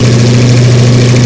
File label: low, leopard, simulated vehicles